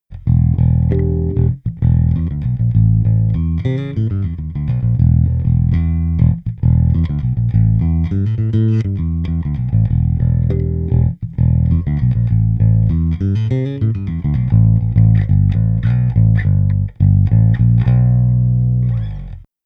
Bass DI Mic Blend Phase; Rupert Neve Designs Portico ss

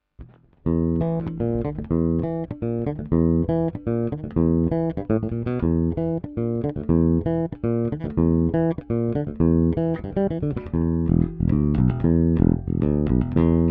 Bridge finger full

